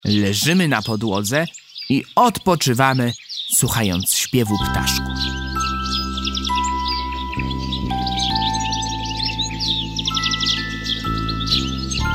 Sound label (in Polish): Relaksacja; Poziom V